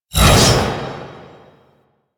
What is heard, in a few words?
Hammer Glass Whoosh A; Warframe; Weapons